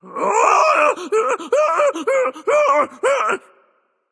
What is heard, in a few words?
fear, voicepack; smite; jorm